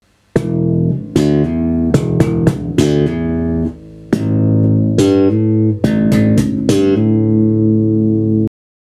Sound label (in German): Aufnahme Slap